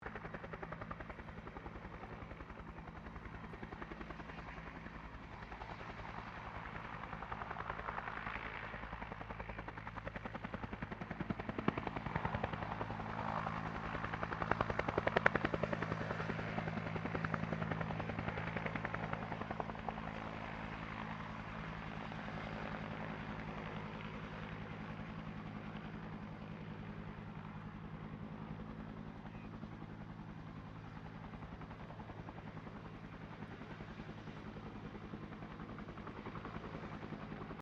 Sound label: HELICOPTER 1967 BELL UH1 IROQUIOS PASS